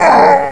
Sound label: human bleed